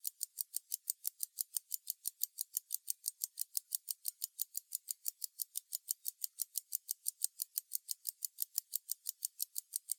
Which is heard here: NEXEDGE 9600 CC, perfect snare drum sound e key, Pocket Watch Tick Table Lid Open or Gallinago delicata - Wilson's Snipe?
Pocket Watch Tick Table Lid Open